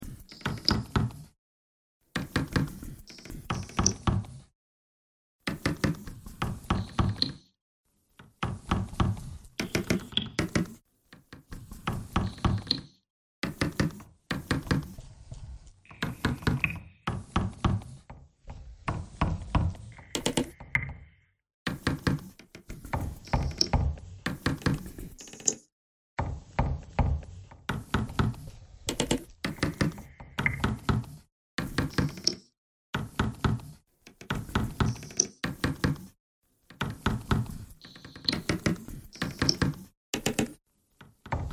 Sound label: bagpipe noise; bagpipeclick